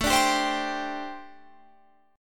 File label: C Suspended 2nd A; C2/A; strummed